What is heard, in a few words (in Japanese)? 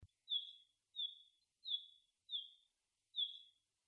jobitaki jinaki